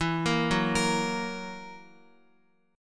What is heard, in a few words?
E Suspended 2nd Flat 5th E down Guitar; Esus2b5 arpeggiated